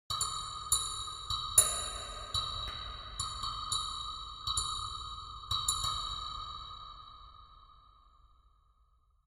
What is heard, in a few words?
ambient synth grain click